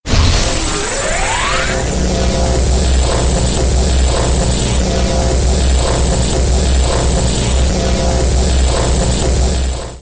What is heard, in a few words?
charge, samus powersuit